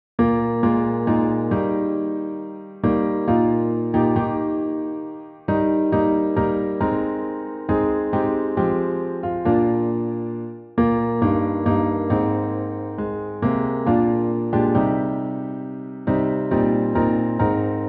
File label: piano